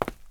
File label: FOOTSTEP CONCRETE; SIMUZENSOUND